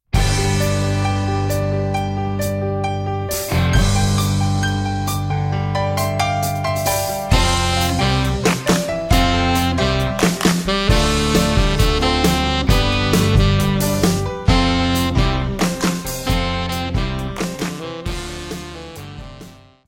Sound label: Instrumental